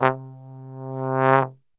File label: C, Brass; Best Service; Brass Super Section